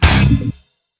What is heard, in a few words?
kill; openarena